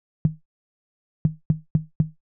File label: Tom Hi; hydrogen